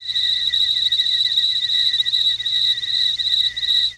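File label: Cicadas Night Background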